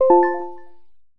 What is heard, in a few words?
ding dong